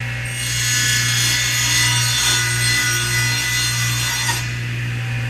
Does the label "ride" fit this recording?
No